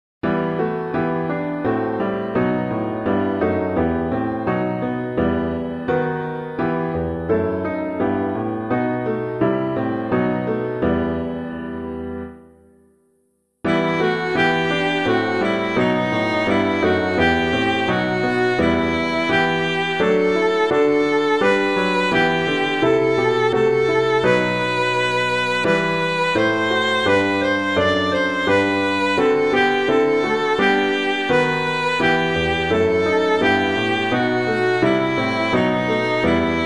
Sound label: piano